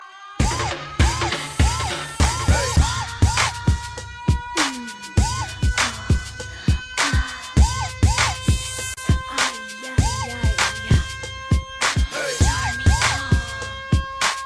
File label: Police Remix